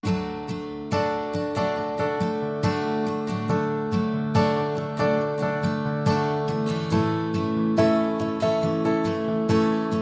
Calm Strolling, No Drums

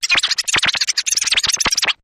Rapid Squidger

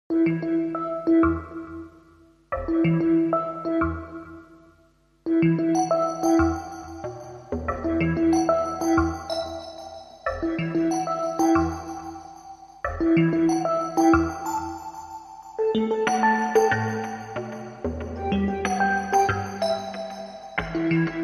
No Drums No Bass

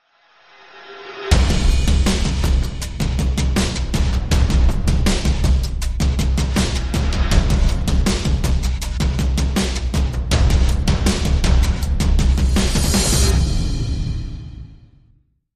Drums and SFX

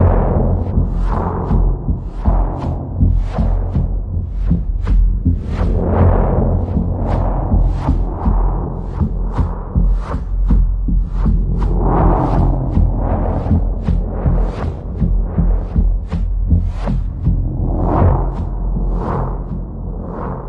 Mysterious Outsider Pulse F, Key of F

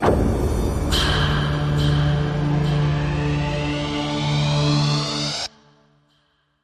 Sonic Charm, No Bells

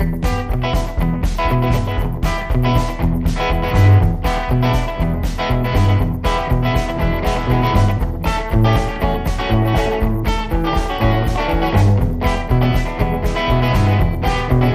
Slapback Country

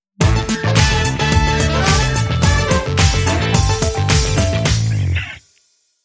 Flutey Loops, Sting

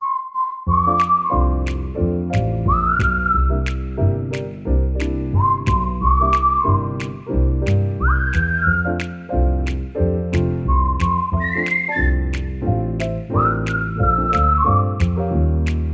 Whistling Jazz Happy Birthday

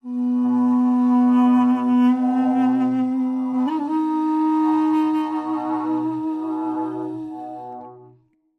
Link Sting

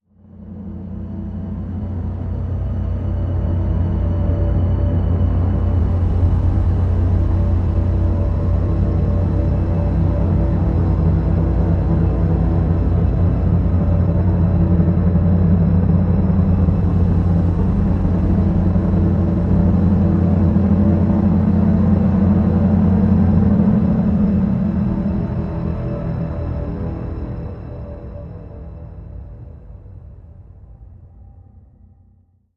Drn Fathomage E

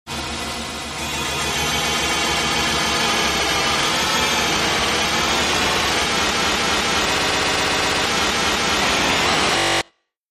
FX Crystals

